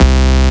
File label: Bass C, Offbeat Bass